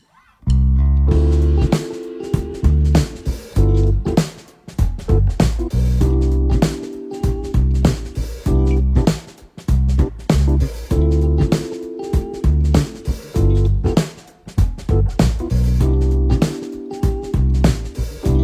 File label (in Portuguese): Progressao ii V I